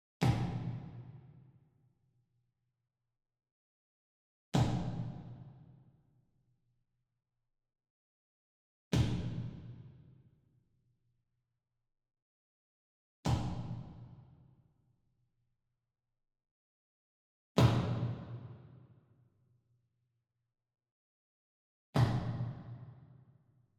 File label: Foot Stomp Far; SWEETENER; boom library; Cinematic Strikes Construction Kit